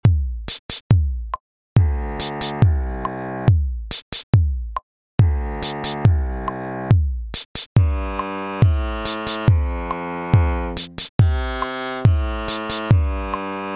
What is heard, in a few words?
Background; RuinAdventurer